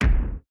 thud; cross-code

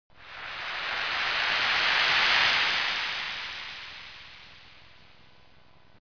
wave; Puffer Popper

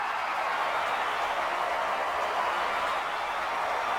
Crowd

